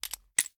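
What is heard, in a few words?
first raise cylinder open, pistol; gmod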